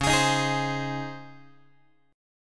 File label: C sharp Major 7th Suspended 2nd, Guitar; C#M7sus2 strummed; JGuitar